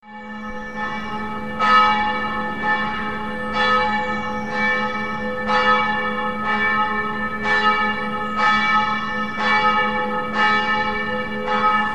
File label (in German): glocke, kirche